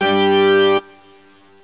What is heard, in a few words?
Organ G; RenderOrgan